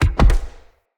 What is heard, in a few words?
Dendrit Bow Shot Punch, Bows; Weapons; Player